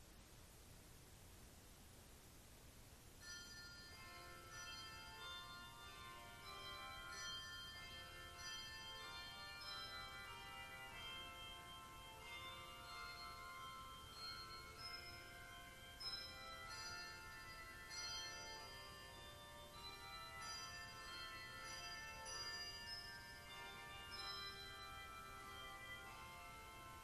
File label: Bells introit